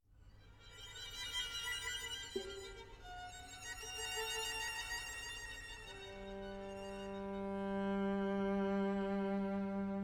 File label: Ambient Music for Strings Quiet; Rule of Rose